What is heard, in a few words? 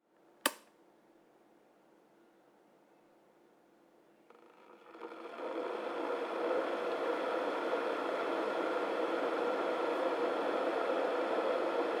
Electric Kettle; spoteffects